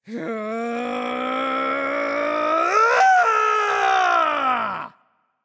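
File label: nonverbal battlecry; voicepack; smite; merlin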